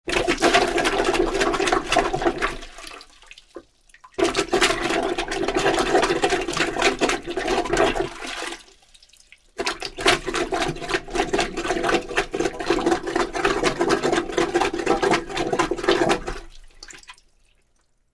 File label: Toilet Plunging